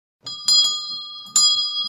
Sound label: door ringing